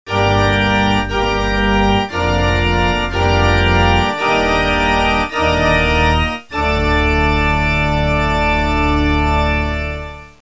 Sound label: Organ Bridge; Music; Pianos & Organs; Organ Bridge 2